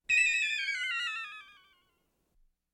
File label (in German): weltraumtaumel auf und abwärts; Science Fiction